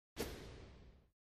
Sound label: Racquetball Swing